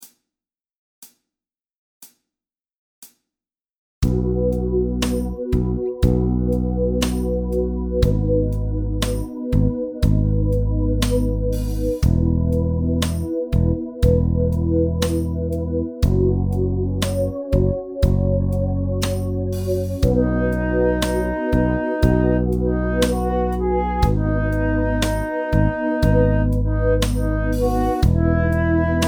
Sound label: Key B2; Piano / Teclado